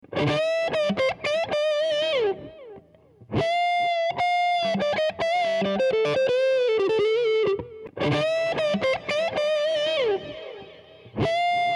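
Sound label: Solo Guitar Stem Dry Wet; Bob Clearmountain; Apogee plug-in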